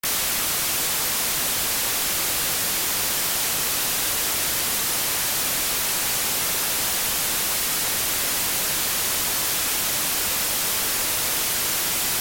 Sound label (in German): so klingt white noise; swr